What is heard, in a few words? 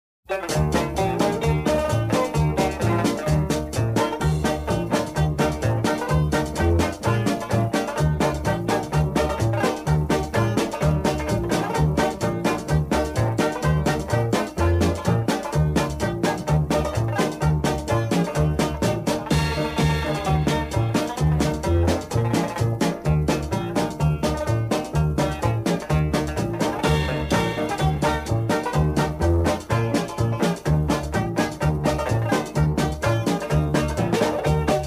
ring ring the banjo, square dance music